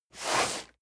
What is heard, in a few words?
cardboard box medium slide hard; objekte